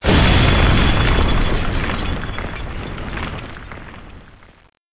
House Crash Sound; Learn to Fly 3; Superman

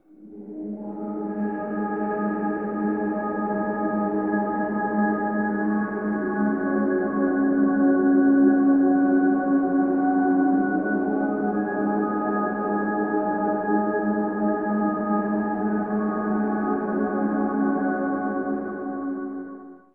Frontend Music

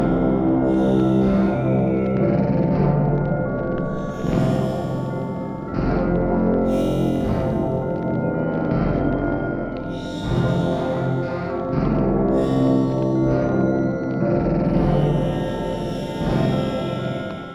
Newsreel Slowmo Loop 80bpm; jenny leclue detectiv

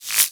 Fx Dragonfly; Bayou; Target Hungryfrogs; Fx Dragonfly Wings

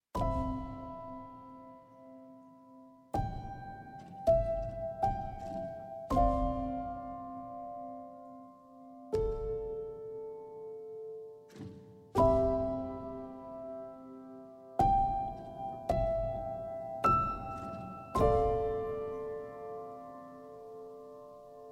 Exploration General Piano

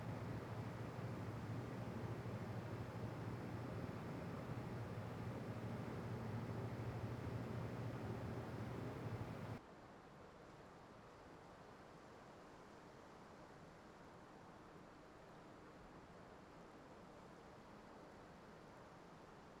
env base Indoor LP

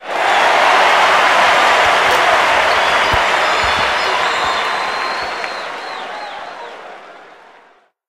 Special Audience Cheer Stereo

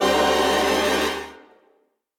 EXPLOSION

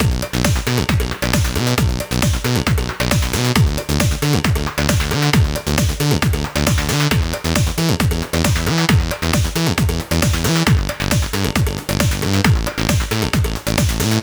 Game Play; Doggie Ninja The Burning Strikers

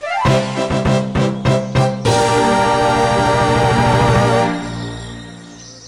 Quest Completed; Old School RuneScape

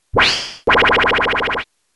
Get Jingle

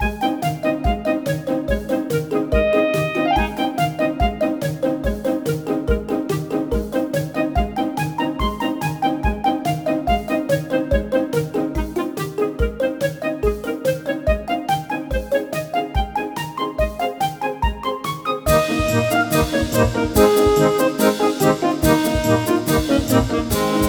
Generic Minigame; peppa pig world adventures

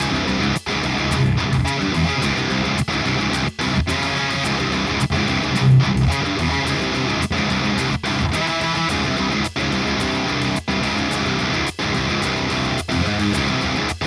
Bard Playing Loop; Warhaven

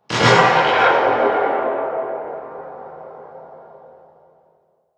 Shotgun Distant Fire

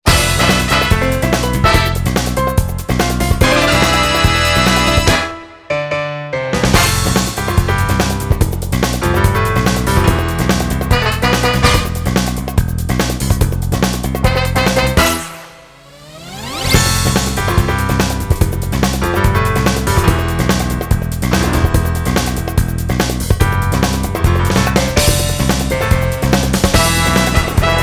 Music Rec Room

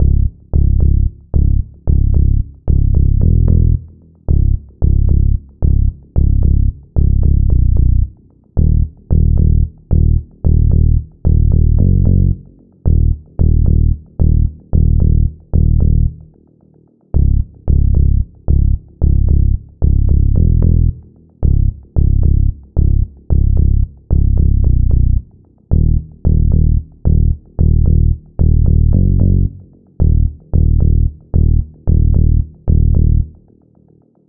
bass

